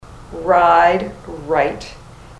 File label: ride right